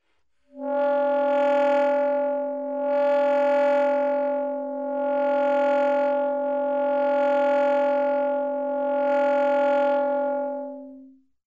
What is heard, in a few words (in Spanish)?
Envolvente dinamica